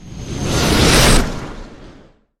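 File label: acceleration sound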